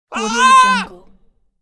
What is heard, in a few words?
male pain thin scream; fxprosound